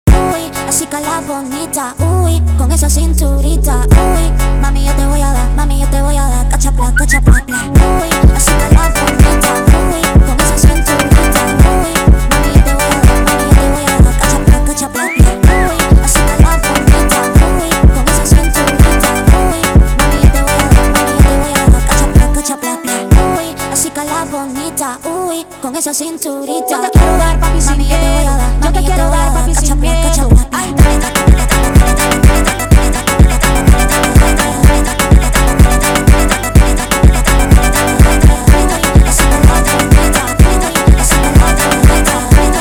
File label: phonk